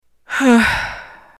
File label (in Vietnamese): Tieng tho dai cua nguoi phu nu